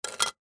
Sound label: industrial tool set down on concrete; leatherman